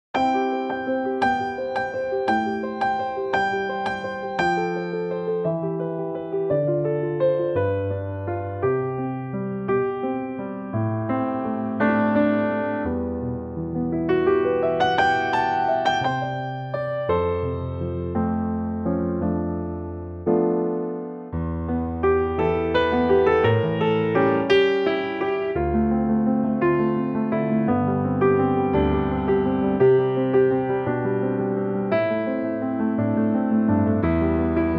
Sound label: C piano